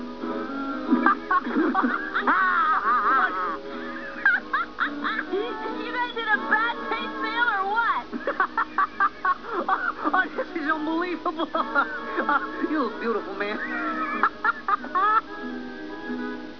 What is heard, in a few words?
duke laughing; (silence.. then laughter)